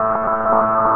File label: Wpn IR CHIRP; PC Gamer IT CD 47; USAF; USAFDEMO